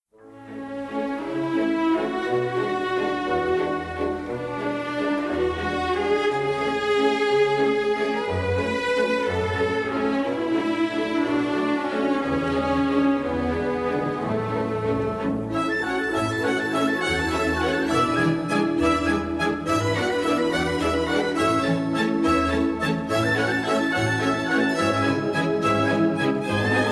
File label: Waltz and Jig; the vestibular system